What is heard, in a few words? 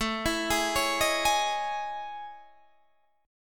A Major Sharp 11th, Guitar Open D